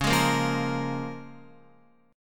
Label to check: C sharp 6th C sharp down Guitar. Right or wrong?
Right